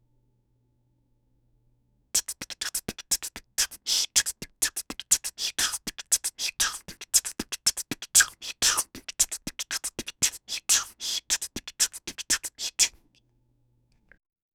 beatboxing softly muffled; human vocalizations

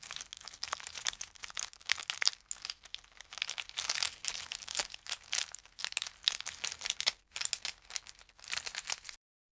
Object manipulation

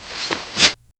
sharp intake of dry breat

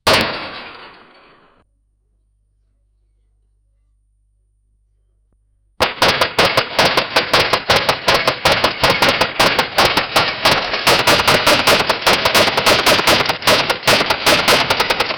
futuristic mech stomping

